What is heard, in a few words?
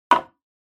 Hourglass Put; 砂時計02-5(置く); タイマー機器